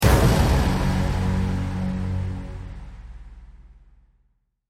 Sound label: deathcam; hades